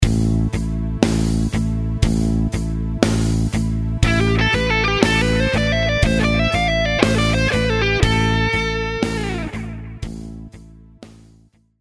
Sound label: Lick 60bpm